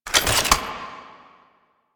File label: Sniper Reload; DE; Warframe; Frumentarius; Exalted Sniper